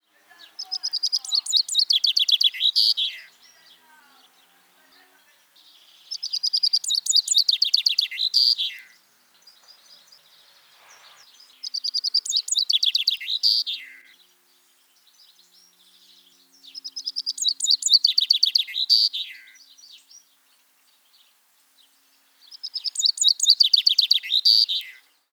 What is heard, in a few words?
Fringilla coelebs Chaffinch young